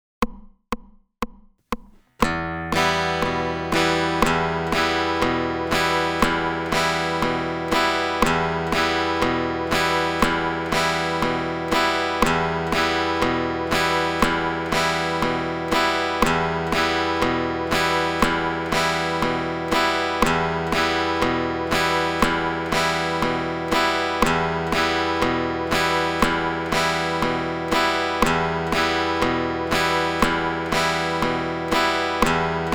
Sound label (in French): basses alternées 120